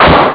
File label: crash claps; Amiga Soundtracker sample